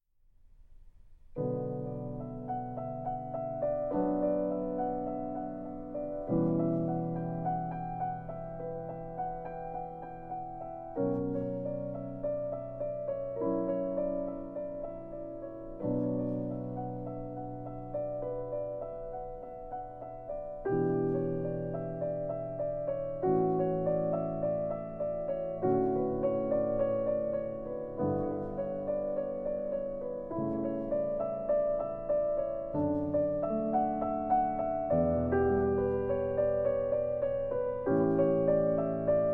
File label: Prelude in B Minor; BWV 855a; Arr. By Alexander Siloti